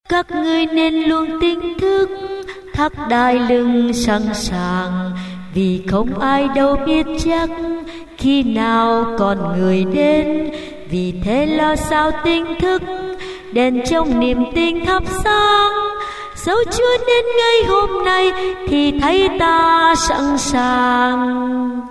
sop alto

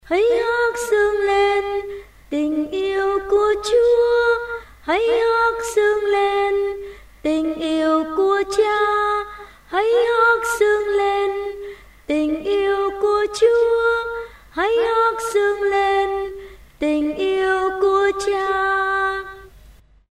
tenor basso

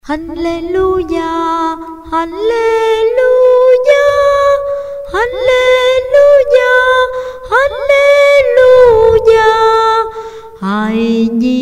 sop alto

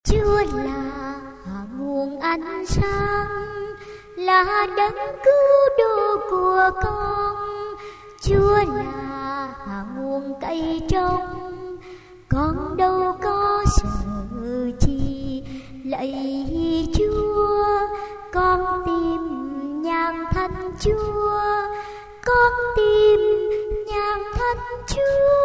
sop alto

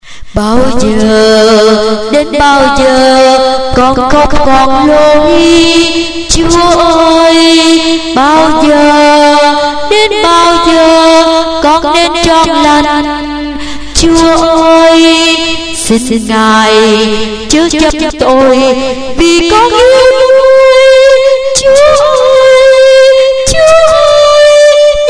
tenor basso

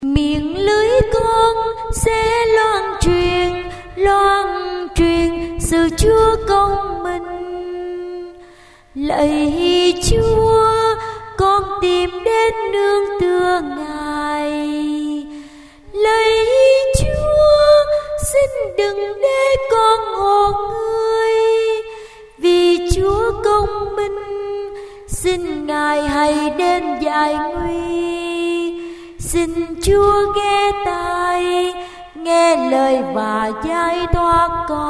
tenor basso

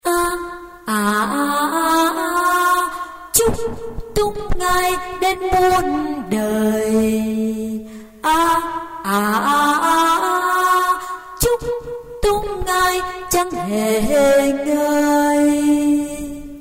basso

